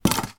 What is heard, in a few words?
household cds multiple drop onto carpet; sound effects one